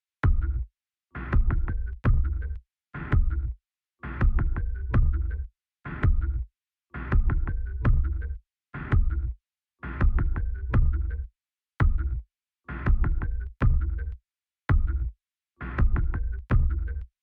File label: Loop Beat; Get Even; City Night Offices